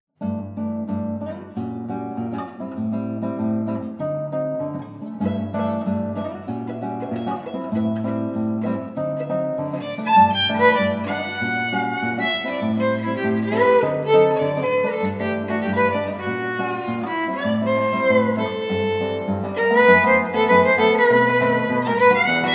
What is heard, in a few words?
Samba